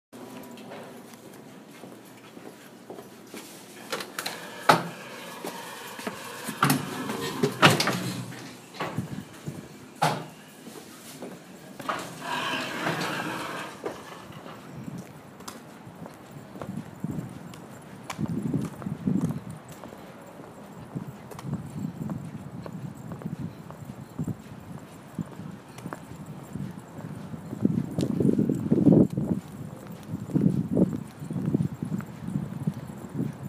Doors; Location: Walking through the netherlands core to my dorm

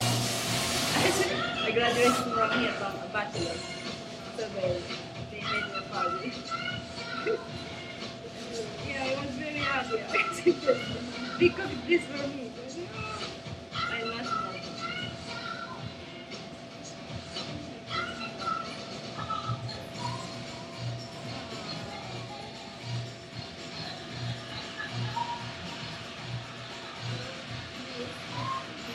Cafe Quad

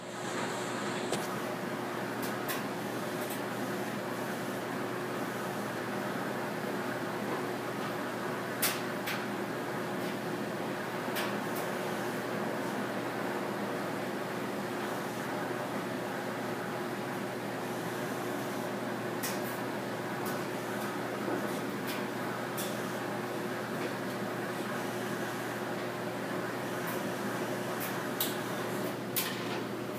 Laundry

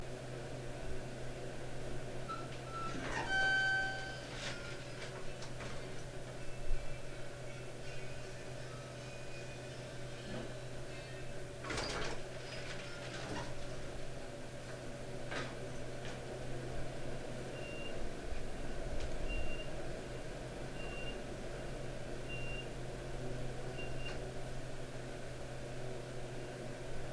Field Recording Elevator; 9th floor

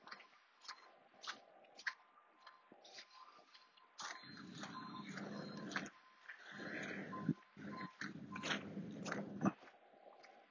Walking in Slushy Snow

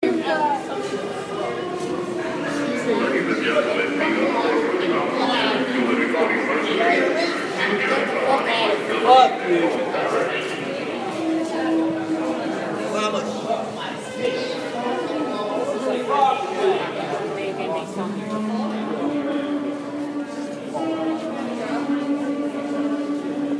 Subway; Location: The subway, NY City

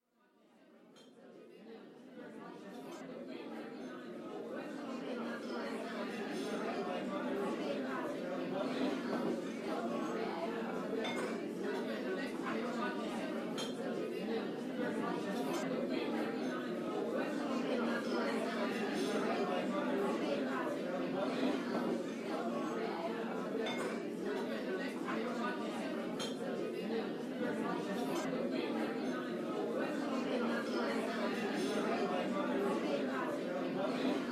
Student Center Dining Room

